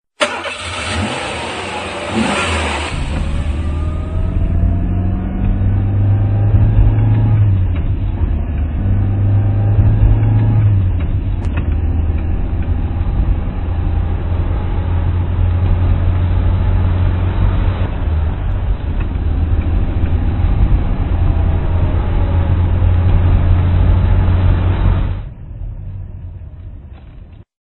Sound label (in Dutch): Auto